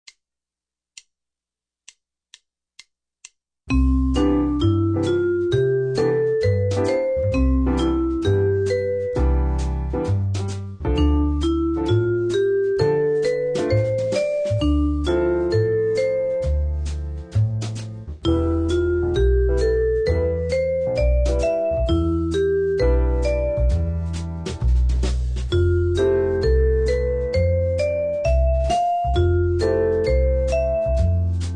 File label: church modes; harmony lesson